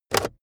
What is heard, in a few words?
car backseat table pull in cupholder fast hard